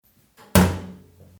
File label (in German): Muelleimer